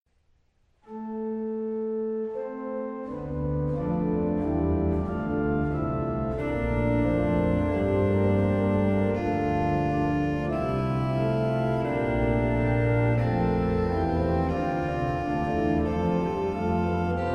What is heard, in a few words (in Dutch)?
Orgelvers Pedal